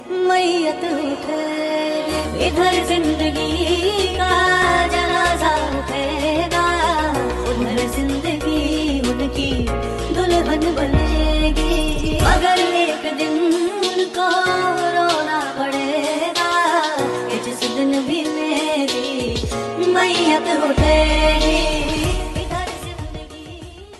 love song sad ringtone, english ringtones

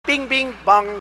bing bing bong; trump

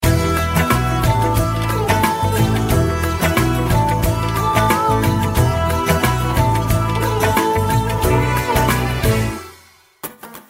instruental ringtone

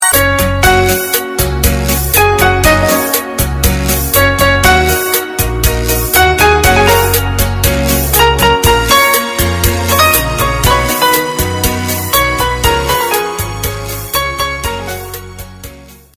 instrumental

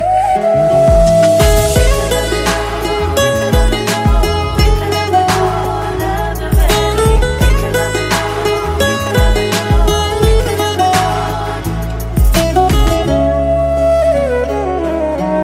music ringtone

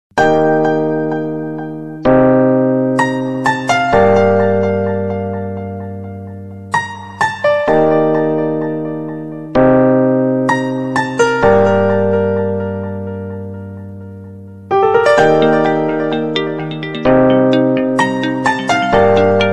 samsung piano ringtone

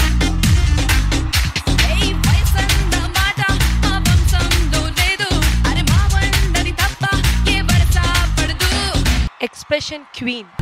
telugu ringtone; dhee champions

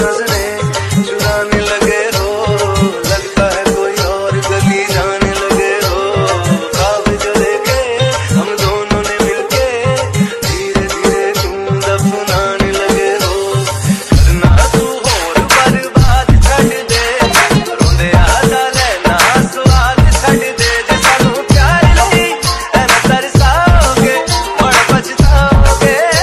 bollywood dj remix ringtone

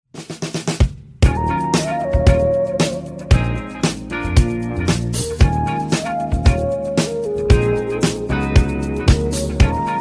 instrumental ringtone

